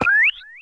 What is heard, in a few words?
Flying Manta Ray